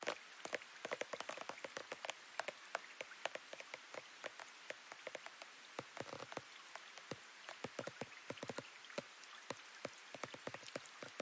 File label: rain light drippy; PMSFX